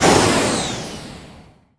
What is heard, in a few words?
Jet Pack End